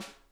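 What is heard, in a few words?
edge tap, Vintage Timetraveler kits; Sonic Reality - Vintage Timetraveler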